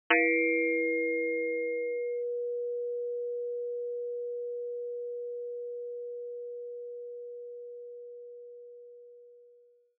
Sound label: gong; graz; Atomcsill előadás kivonat